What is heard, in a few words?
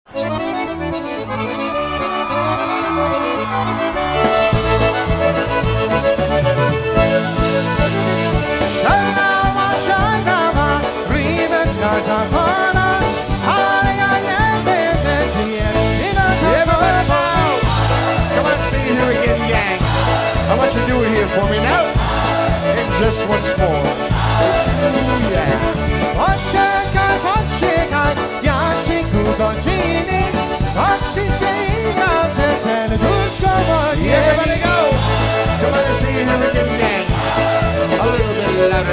Lovesick Polka Live Jam